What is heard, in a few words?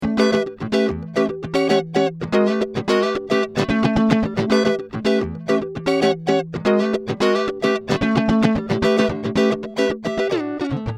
Twin Reverb + Phaser; fender